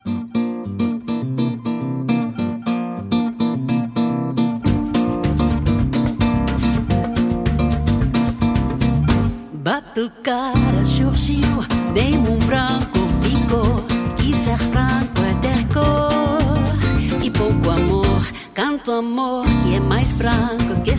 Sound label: Batucada